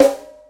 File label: thick snare one shot c sharp key